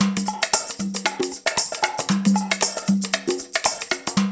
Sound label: loop, percussion; InVision Interactive; Percussion Slam; PRIMADONNA